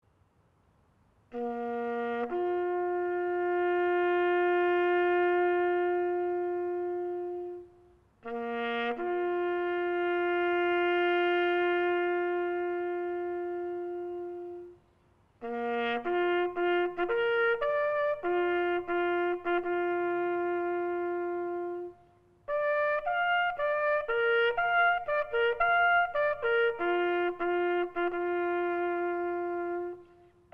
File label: Trumpet